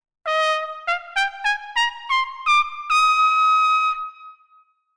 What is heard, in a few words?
High Trumpet